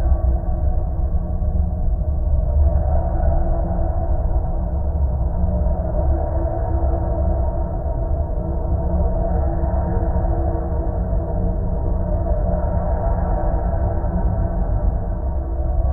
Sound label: ambience; Harvest Massive Encounter